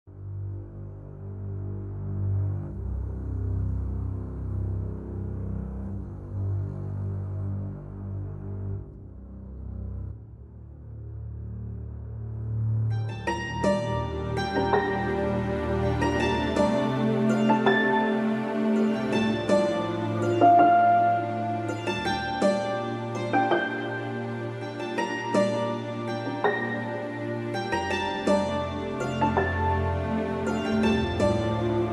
Hammered dulcimer

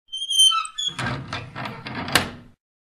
rusty metal

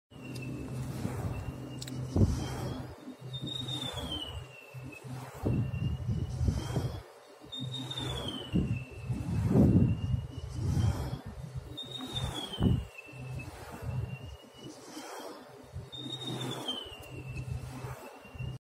wind turbine

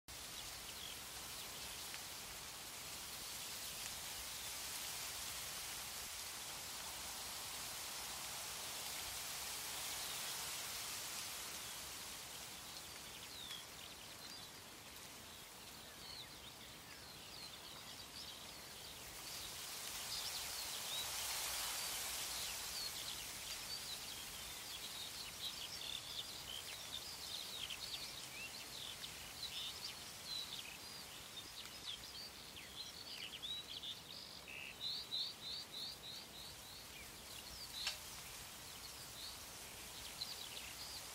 Wheat Field

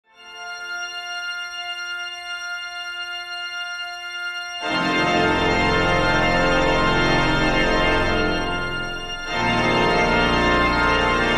Organ

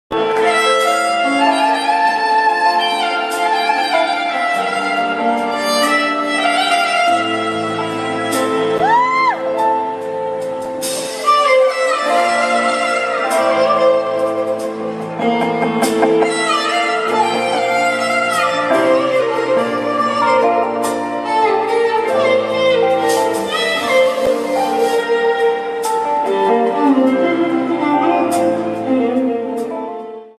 violin

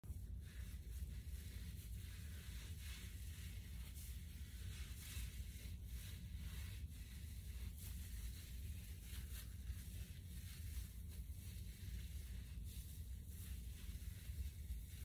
rubbing hands

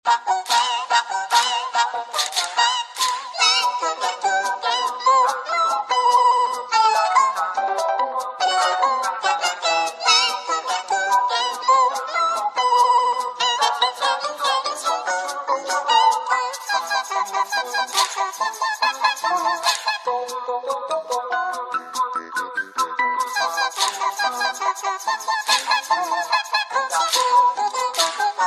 Korean ringtone

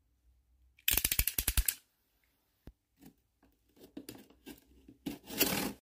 Cutter

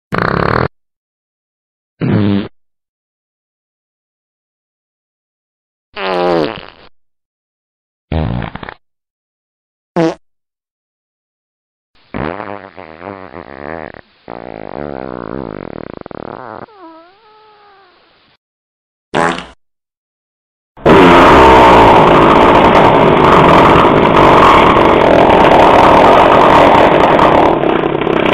funny ringtone

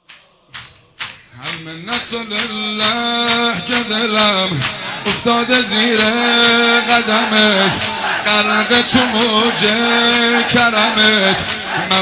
madahi ringtone

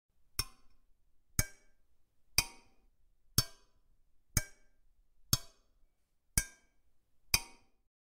mattock

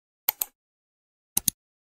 Mouse Click

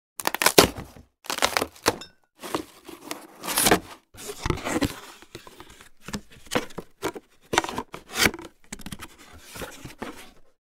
Cardboard box

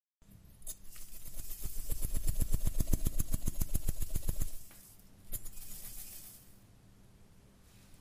Salt Shaker

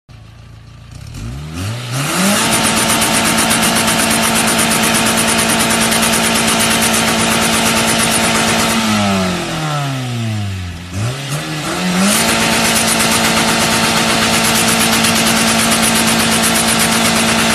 Exhaust whistle

